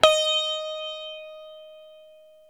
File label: SITAR D#4, CORAL SITAR; East West; Steve Stevens Guitar Samples Collection